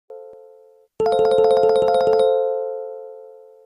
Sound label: blackberry ringtone